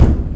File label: Explode